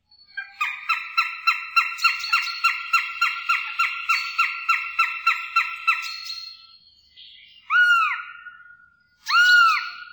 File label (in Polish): jastrząb gołębiarz, głosy